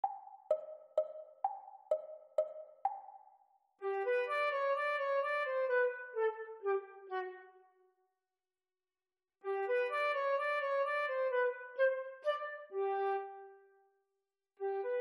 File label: PLAYALONG Flute Duet Minuet; Grade 3; Exam Speed; Haydn